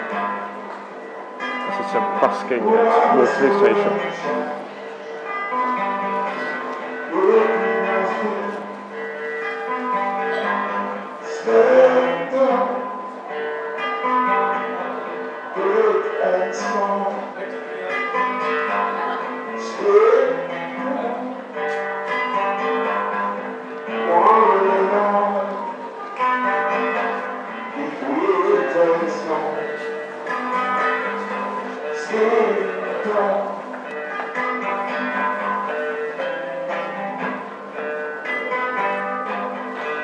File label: waterloo station busking